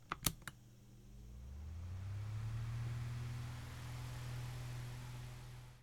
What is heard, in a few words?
wind machine